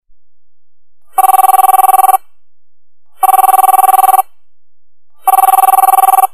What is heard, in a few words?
Matrix Phone; Ringtones